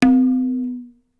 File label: tabla; Dirt-Samples